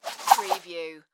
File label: Whip Cord Whoosh; Foley; Sound Effects Bible